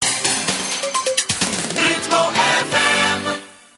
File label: jingle